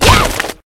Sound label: land